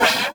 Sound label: Multimedia Internet CD-Rom Flash Wipe